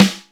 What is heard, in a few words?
mild mute s, Jazz; SN-R8-05 Jazz